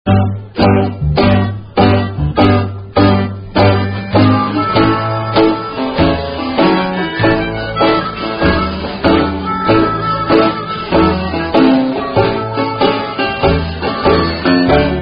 Jug Band Music

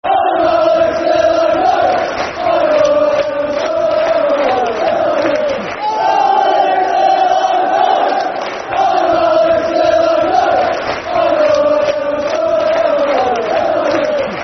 Alex Till I Die Football Chant; Crewe Alexandra Cafc

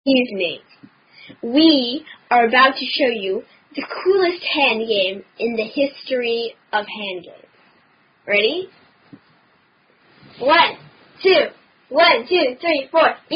Children's Hand Clapping Rhyme; Eeny Meeny Sizzleiny

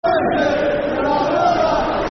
Football Chant (Soccer Songs); Championship; Hartlepool United Hufc

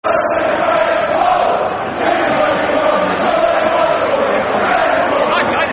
Football Chants; Worse Than Graham Poll; Reading Rfc

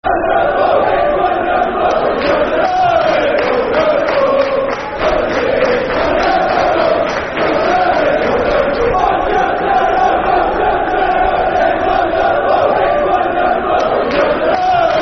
Football Chant, Soccer Songs; Cheltenham Is Wonderful; Cheltenham Town Ctfc

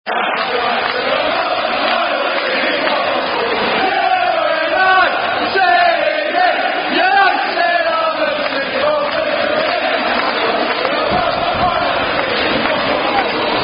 Football Chant; Blackburn Vs United - U-N-I T-E-D; City Fuck Off Home Football Chant

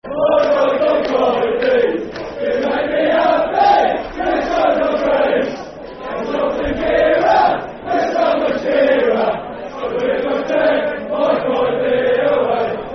Chant Football Chants (Soccer Song); Michael Kightly; Wolves; wolverhampton wanderers; fanchants

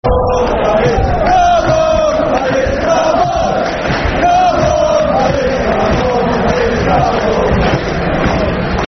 Come On Palace, Football Chants; Crystal Palace Cpfc

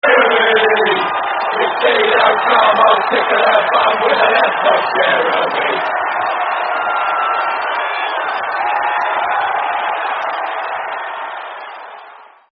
No Cheer; Newcastle; Geremi; terrace chants

